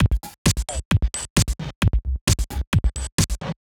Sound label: STRIKE DRUM LOOP; Alien Imaging; STRIKE FX